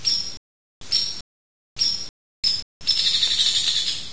hairy woodpecker

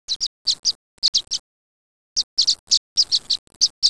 Violet-green Swallow

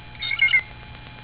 bluejay tool

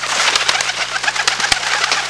PIGEON wings

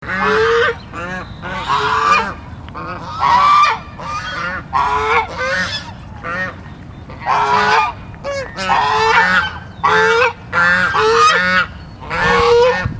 white geese

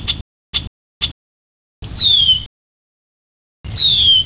redwing blackbird call

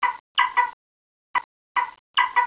Eagle

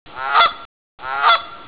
canada goose

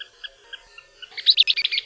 west kingbird

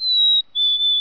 black-capped chickadee

